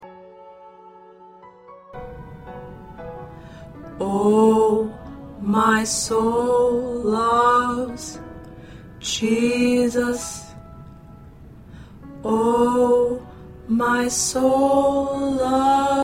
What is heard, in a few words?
Tenor, choir parts